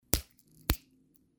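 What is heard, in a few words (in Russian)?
два звука разлома тонких косточек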